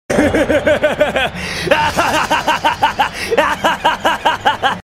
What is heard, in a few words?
hahahaha; svencoop; FZSaySound